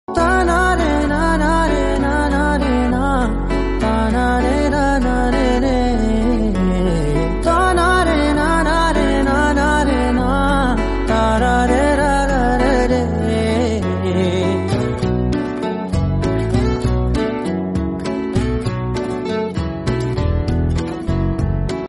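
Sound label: background music Ringtone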